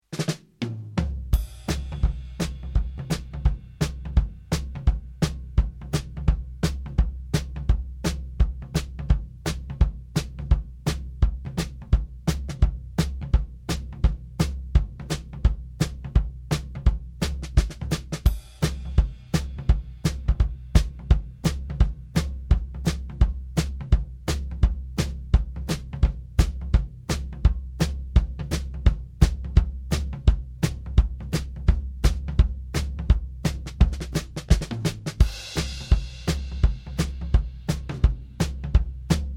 170 70s pop

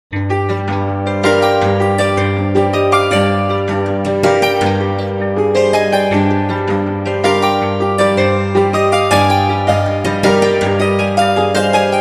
harp guitar melody

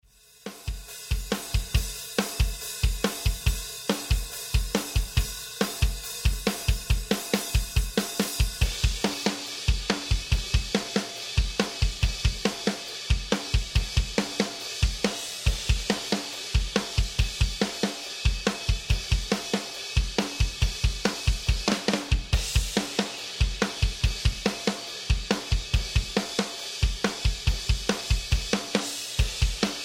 140 heavy rock full

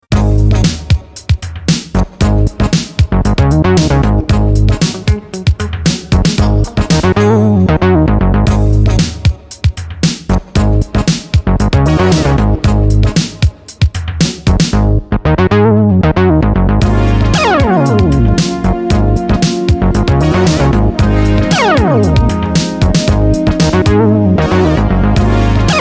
e funk synth

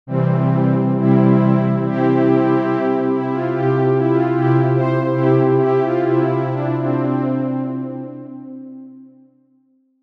Jupiter lo strings; pro12

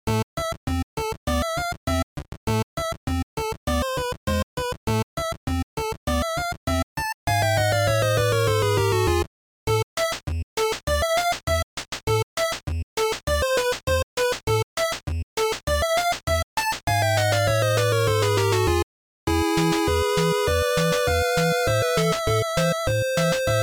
haunted house 8-bit

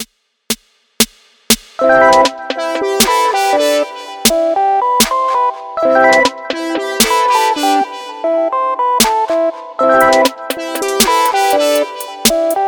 organic-ish serum presets

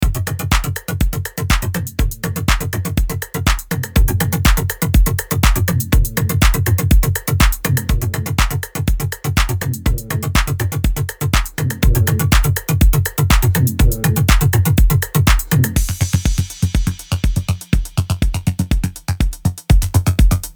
techno; boost

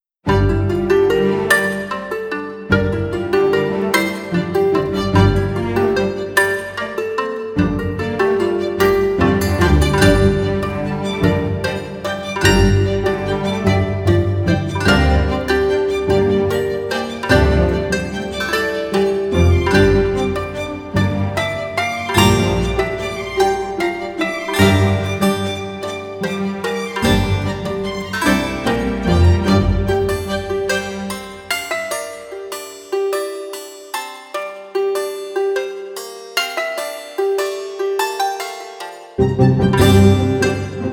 strumstick

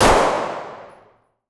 357 magnum revolver gun shot

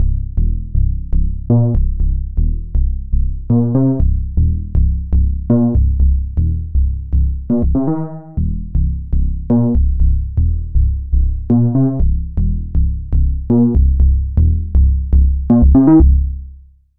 barrel bass

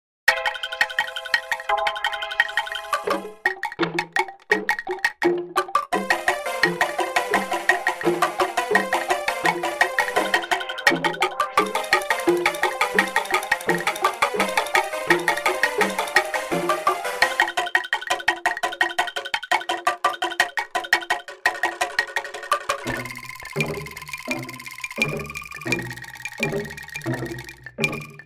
anklung; flies

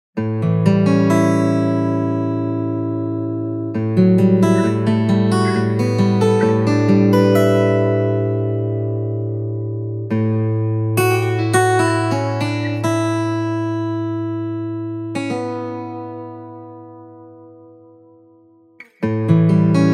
solo guitar

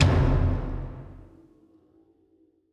colossus kick

